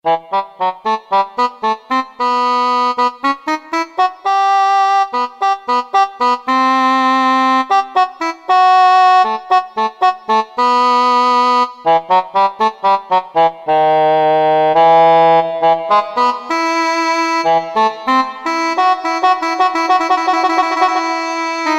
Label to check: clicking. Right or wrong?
Wrong